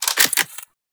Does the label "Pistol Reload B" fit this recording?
Yes